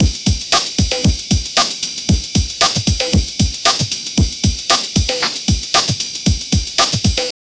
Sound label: amen break 115 bpm